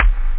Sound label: pop; Workbench Add-On - Volume 1; BASS